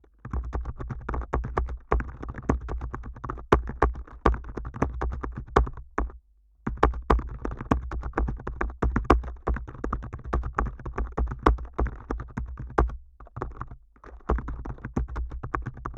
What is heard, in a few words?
natural rhythms